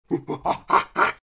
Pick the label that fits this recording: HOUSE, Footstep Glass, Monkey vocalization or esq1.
Monkey vocalization